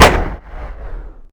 m3 grease gun; WAR

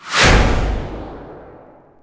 bazooka fire; WAR

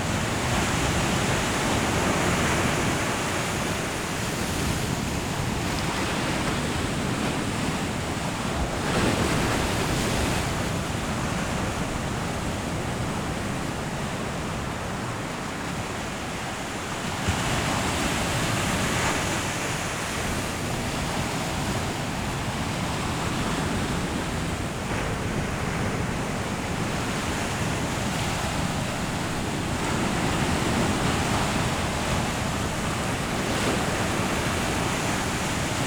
heavy waves comin; WAR

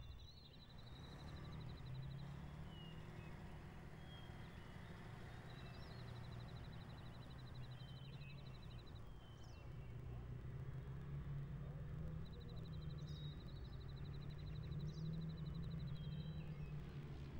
allied camp; WAR

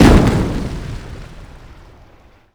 medium impact generic; WAR

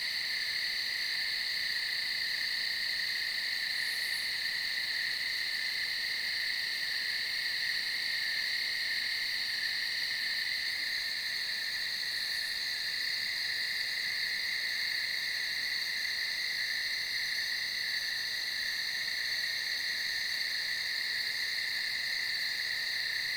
crickets light; WAR

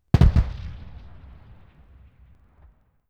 distant gun 60mm; WAR

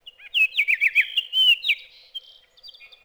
oriole

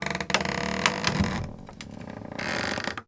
wood creak; WAR

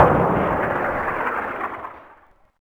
distant shot; WAR

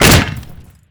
jeep destroy main gun; WAR

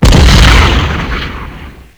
grenade; WAR

